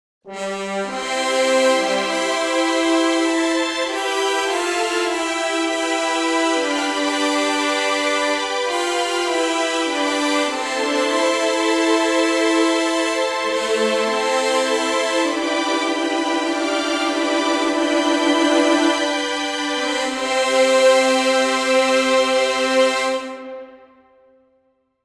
triumphant

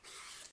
peel pumpkin

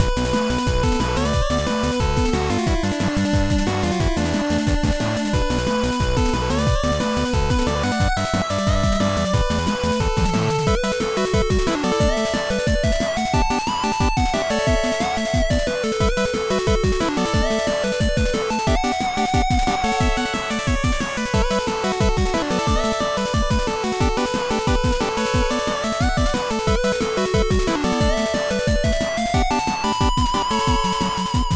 8bit On The Offensive

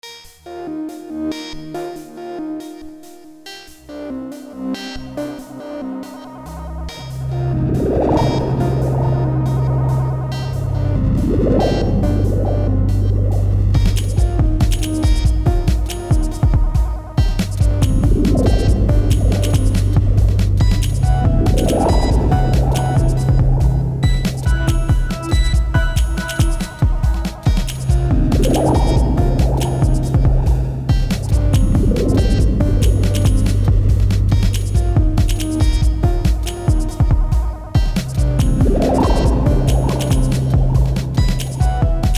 bubble

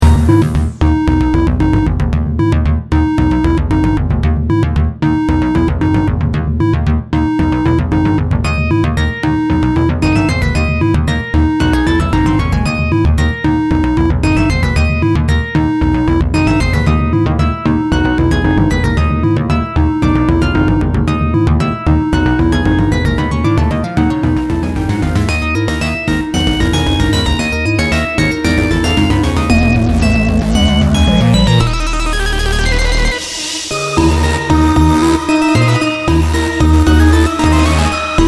battle; zero 2022 remaster update

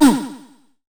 death

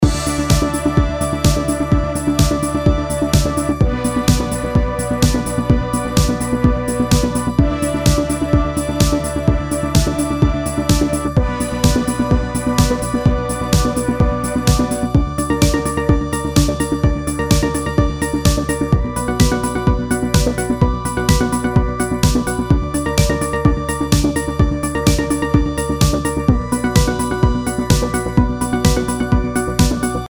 Its a dirty 90s Hous inspired Song

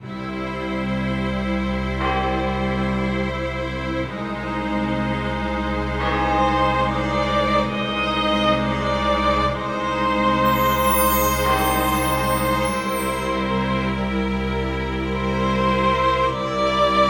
Lament

